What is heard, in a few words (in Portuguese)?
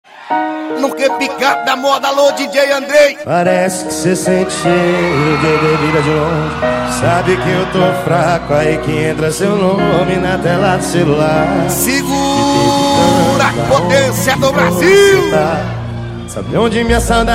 sertanejo com locutores de rodeio